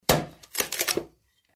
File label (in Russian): udar i razlom potonshe; Удар топором и звук разлома; В лесу звук топора по дереву